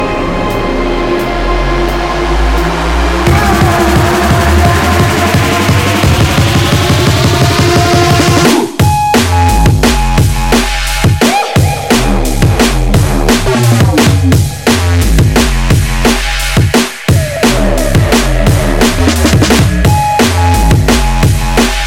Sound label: drum